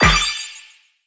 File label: Bingo Open Ball